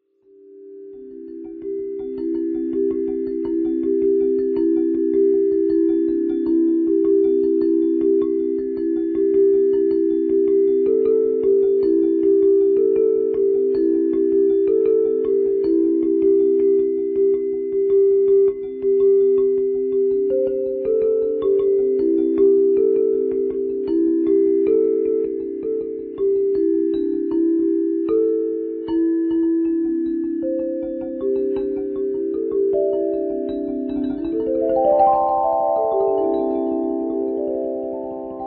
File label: metallophone